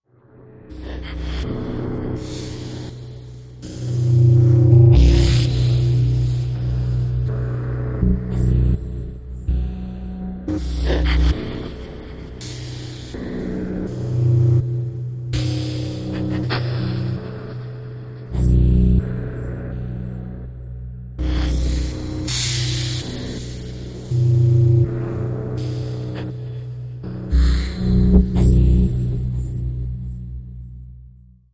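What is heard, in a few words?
Demon Work Shop Machine, horror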